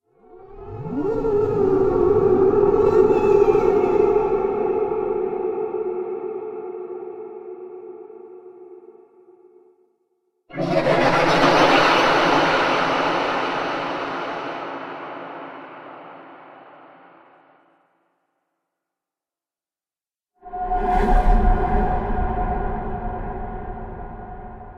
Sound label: Horror Ghosts; Horror Pack - Ghosts; Ghost Sound Effects; Haunted House Or Graveyard